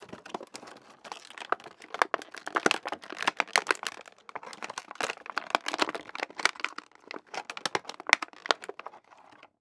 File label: Plastic Package Movement Crumble; foley